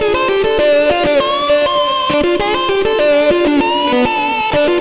xtra lead

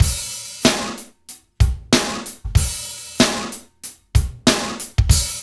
DRUMS; East West; Funky Ass Loops

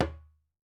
WALK DRUM, African Drums; E-MU Formula 4000 Series Vol. 4; Earth Tones

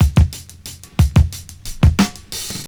OLD SCHOOL, Breakbeats

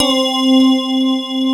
WAVE OLA, synth

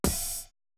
perc, Roland MC-909

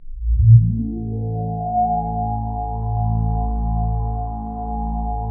A1, KORG DW8000, Vintage Keyboards; Big Fish Audio - First Call Vintage Keyboards

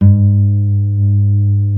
A NYLON, Guitars

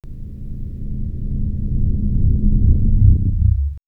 SciFi Rumble Ship; X-Static Goldmine

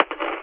hardbassdrumz, breakcore; Bazzdrumz

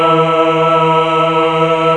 MELLO CHOIR

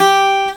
C GTR HARD G3, Celtic Flavours; USB Soundscan vol.42; AKAI

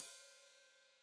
Hat Foot Open, Hi-Hat; Deeply Sampled Drums

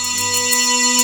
DIRTY HORN, Synth FX Misc; E-MU Producer Series Vol. 8 – Platinum Phatt

